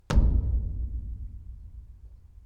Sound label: Bump Against Metal Plate